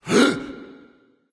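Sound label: nonverbal breath; smite; voicepack; ullr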